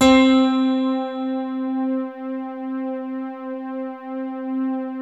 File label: BALLAD C3, E-PIANO; Music Store - Claudius Diemeter Schwerlos